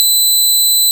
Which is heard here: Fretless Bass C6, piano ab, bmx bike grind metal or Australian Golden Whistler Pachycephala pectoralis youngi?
Fretless Bass C6